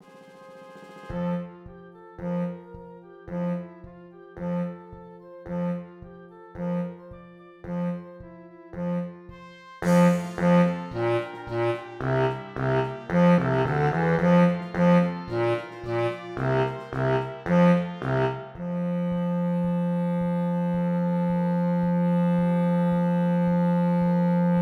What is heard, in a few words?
tuba